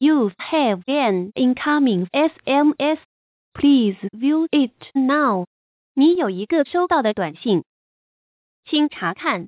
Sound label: sms tone